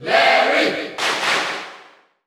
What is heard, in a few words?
Cheer Dutch; SSBU